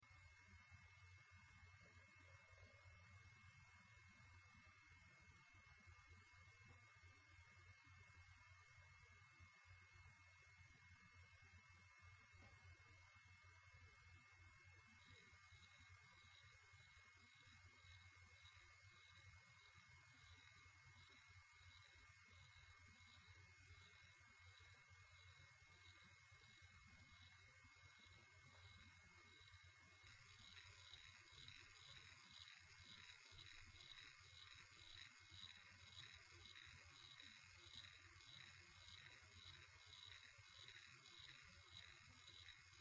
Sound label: western caviar gp